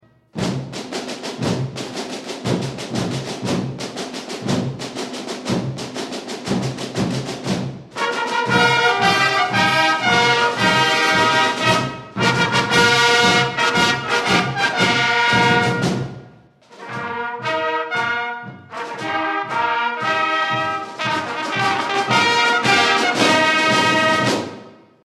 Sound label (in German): Daenischer Marsch